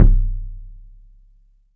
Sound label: THUD KICK; 3D Drums